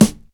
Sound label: puffy snare g sharp key, Loudest frequency: 1502Hz